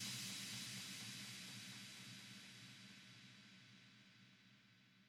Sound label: dith